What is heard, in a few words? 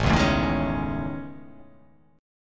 A Major Sharp 11th